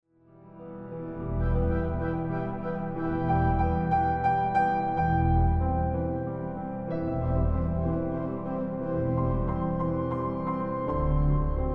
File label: Keyboard concerto un poco adagio